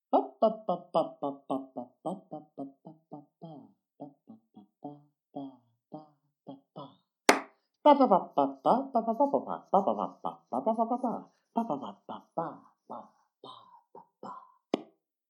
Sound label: Plash